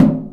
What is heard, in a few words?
Physics Metal Box Impact Soft; Counter-Strike: Global Offensive